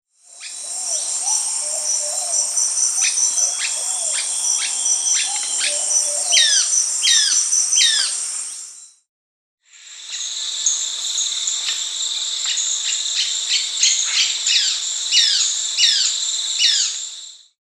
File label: Ochre-bellied Flycatcher (Mionectes oleagineus), Peru; Birds of the Amazon; S. America